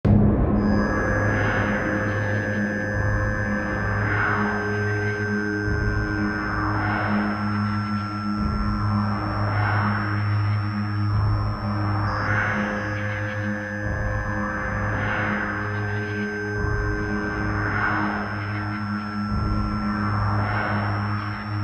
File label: courtyard portal raise theme